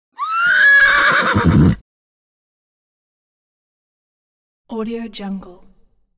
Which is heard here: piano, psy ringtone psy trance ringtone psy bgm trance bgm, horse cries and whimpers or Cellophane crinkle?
horse cries and whimpers